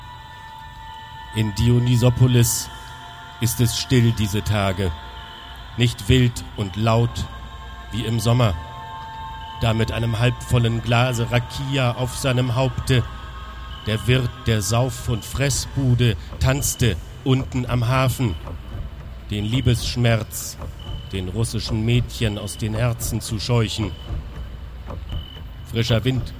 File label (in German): ohne Stimme